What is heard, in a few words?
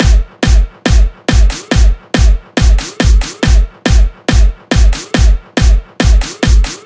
drum Loop 140BPM Full